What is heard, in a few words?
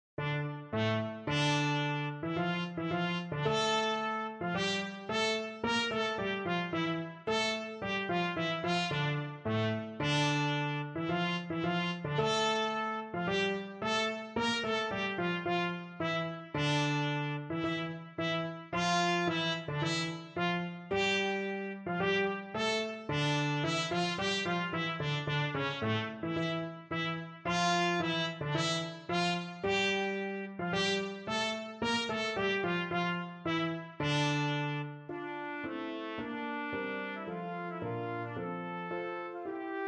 Prelude trumpet; L'Arlesienne